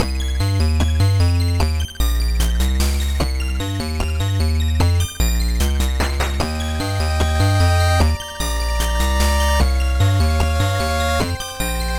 8Bit loop Start; HGSS; Ice Path

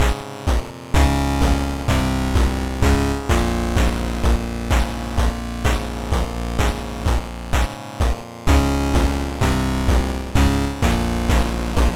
8Bit Theme Outro loop

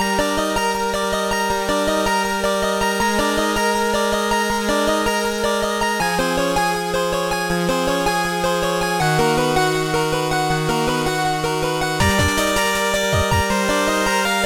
8Bit loop; BW; Dragonspiral Tower

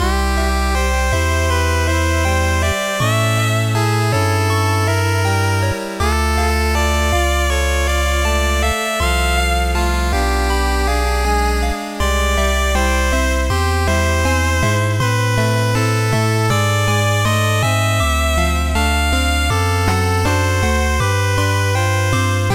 8Bit Town Day loop; DPPt; Twinleaf

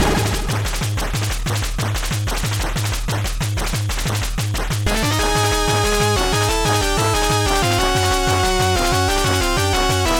8Bit Battle loop; DPPt; Cynthia

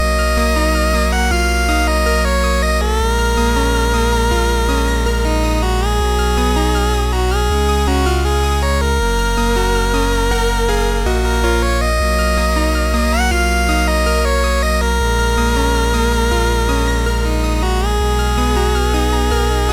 8Bit, loop; PMD2

